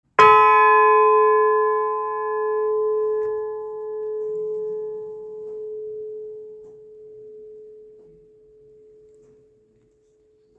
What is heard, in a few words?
bells of sussex; balcombe